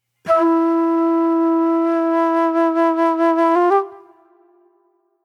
Sweet Soul Flute; Sonic Foundry (Sony Creative Software); Manhattan Flutes; Good Times

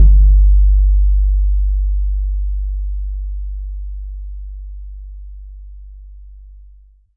BAYAN; Q Up Arts; Denny Jaeger Private Collection

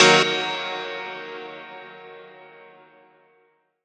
Piano Verb, Old Skool House; Big Fish Audio; OSH Chords

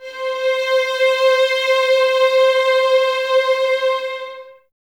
Violins Soft C 5, String Ensembles; Miroslav Vitous; 11 Violins

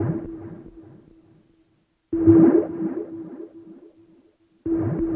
Water FX; Sonic Foundry (Sony Creative Software) - Stylus Pressure Urban Grooves on Digital Wax; Alien Tribe

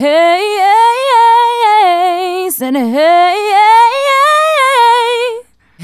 Female Solo, Vocal

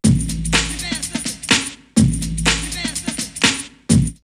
Beatmaster 124, drumloops, Techno&House; X-Static Goldmine

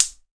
Spark, household rhythm samples hits

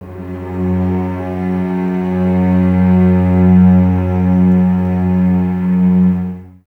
Cellos F#2, String Ensembles, No Vib; Miroslav Vitous

